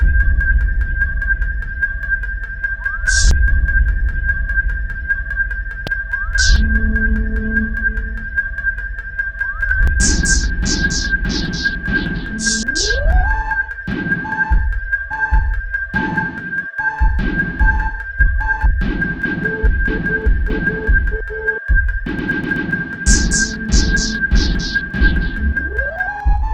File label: Sinewave